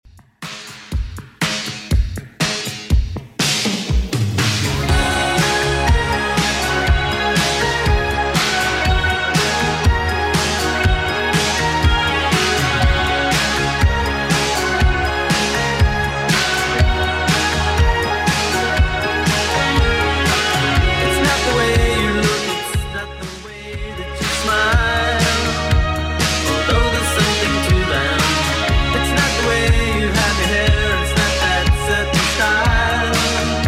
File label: 80s Redrum 121bpm